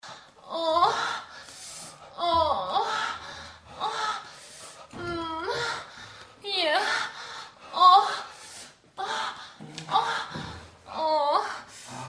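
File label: male female sex indoor; Sexy Witch 2 The Bungler and the Witch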